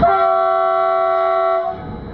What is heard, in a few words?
klaxon, Train; H-START Stadler KISS